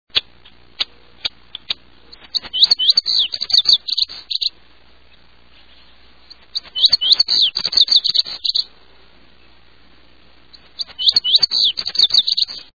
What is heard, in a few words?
Lazuli Bunting